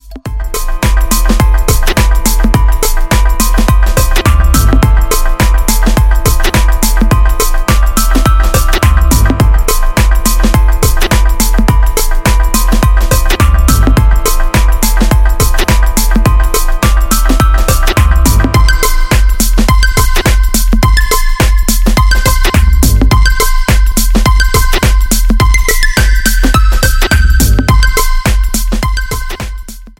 Dynamic Beats, ringtone; MIUI12

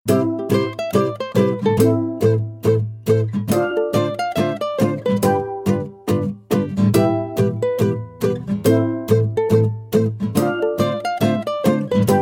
Soft Warm Ukulele Ident

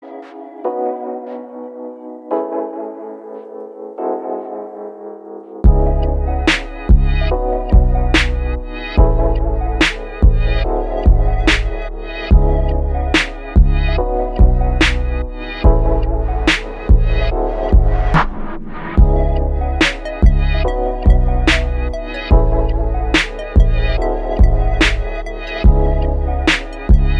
Lo Fi Beats; MIUI12

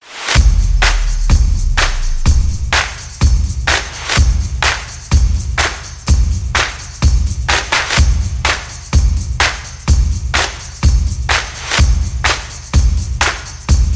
Percussion Claps (short); MIUI14; HyperOS

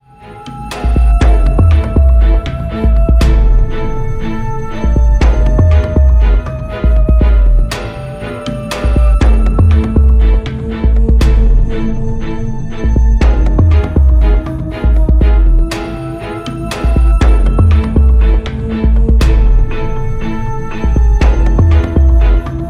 Tense Combat Grand Orchestra; MIUI12

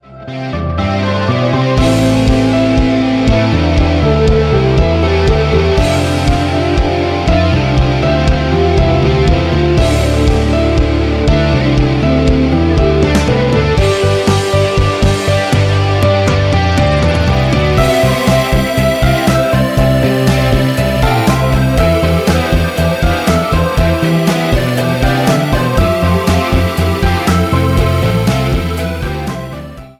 Up tempo; MIUI12

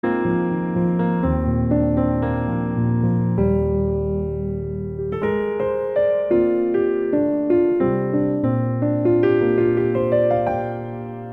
Spring, RINGTONE; MIUI12

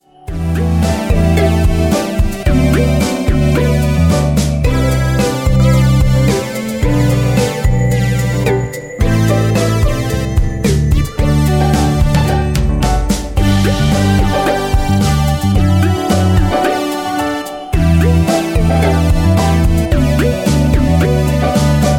Cartoon Happy Pop; MIUI12

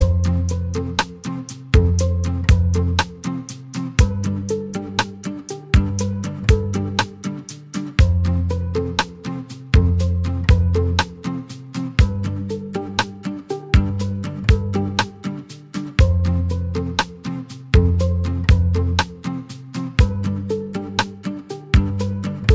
Crystal Spring Healing, RINGTONE; MIUI12

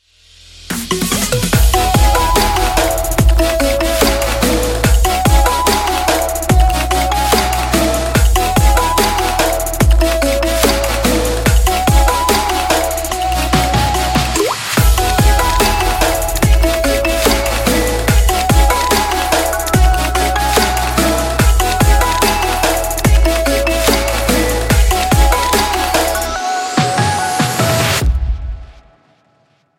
Summer Electronic Ident; MIUI12

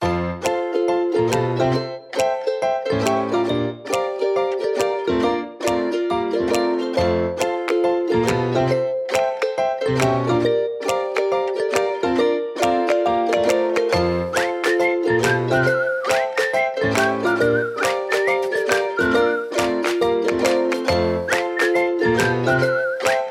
Positive Fun Ukulele; MIUI12

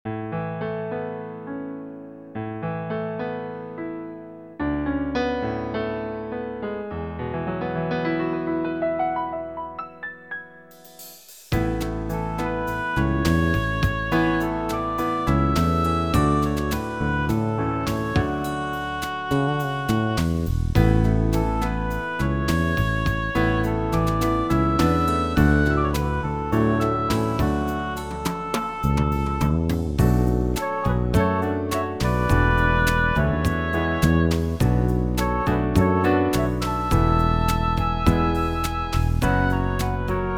Soft Sound; MIUI12

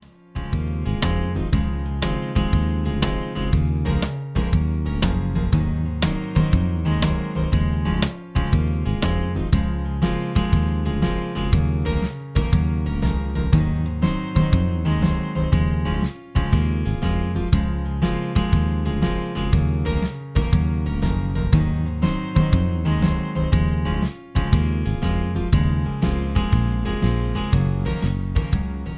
Happy guitar; RINGTONE; MIUI12

